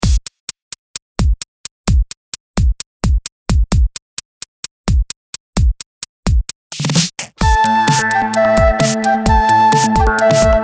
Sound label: Quena flute